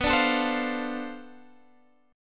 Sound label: B Minor 7th Flat 9th Flat 5th B, guitar chord